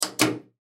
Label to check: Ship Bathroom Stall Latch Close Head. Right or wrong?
Right